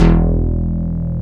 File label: XFM BS, FM Syn Bass, Synth Bass